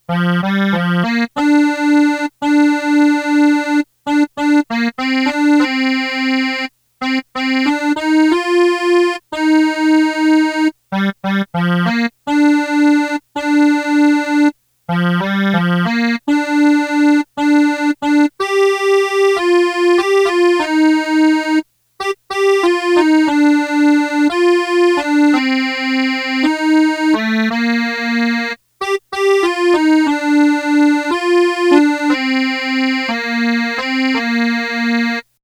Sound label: melody